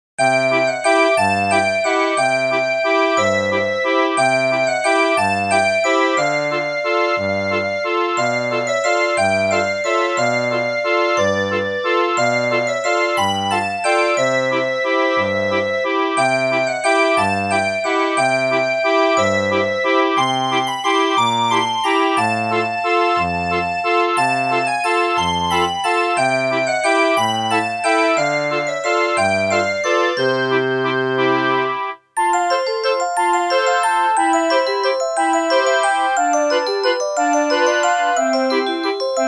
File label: Music Box House; majoras mask